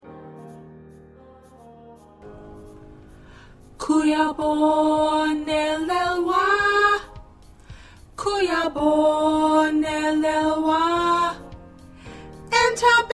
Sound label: Alto